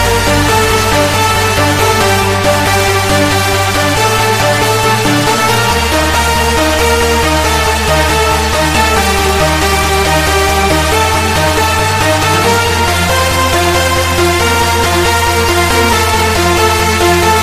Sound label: extended mix ringtone